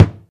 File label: thick bass drum one shot g sharp key, kickdrum sound tuned to the G# note